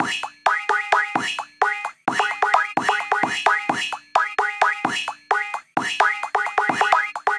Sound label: Jungle Beat 172bpm, MIDI Drum; Ableton; Factory Packs